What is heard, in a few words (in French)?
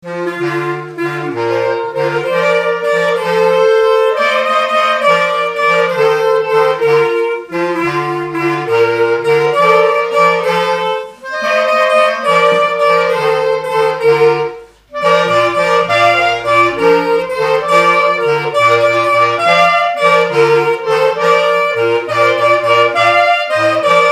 jeu ensemble, clarinette